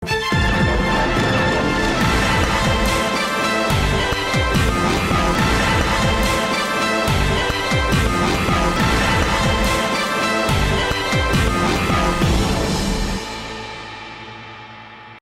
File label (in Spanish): Sintonia de Bomba Neutralizada